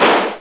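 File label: small explode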